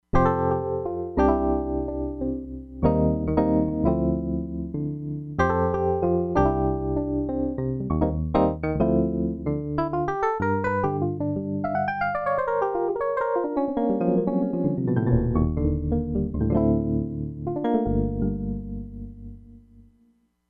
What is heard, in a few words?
ELECTRIC PIANO, Crumar Bit 99; Factory Programs